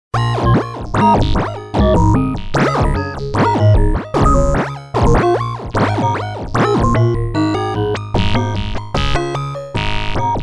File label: random Mod; Euro Dual Phaser